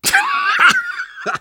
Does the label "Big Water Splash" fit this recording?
No